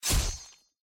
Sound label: physics damage; mod ff battle